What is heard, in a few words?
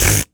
Human Beatbox, Beatbox Hits; Loopmasters; Vocal Hits And Kits; Human Beatbox 1